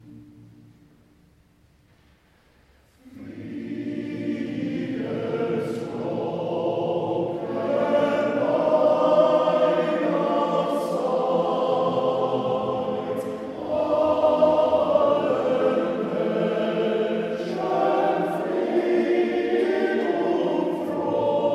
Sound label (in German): friedensglocken; Chronik 2025